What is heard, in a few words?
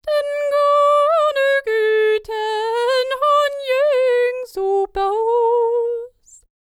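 Scandinavian, World Voices; ILIO; Vocal Planet VOL-5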